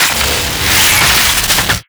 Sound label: shockwave rise